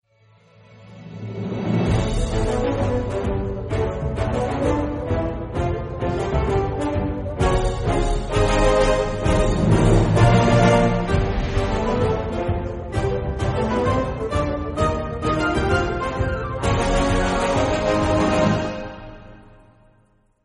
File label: Victory Fanfare; asterix obelix xxl3